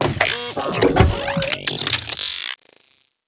tug

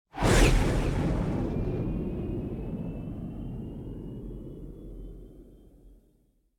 enter sector, ships waypoints

